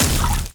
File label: death burst small